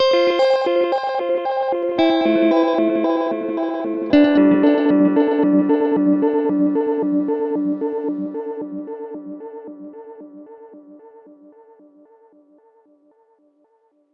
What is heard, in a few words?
5th cluster; uad korg sdd-3000 test